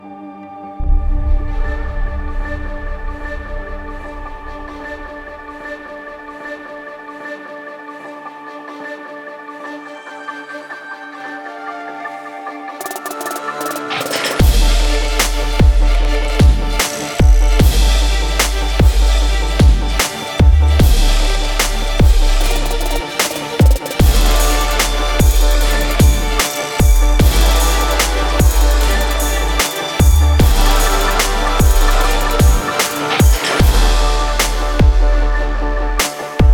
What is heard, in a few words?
Orchestral Trap Music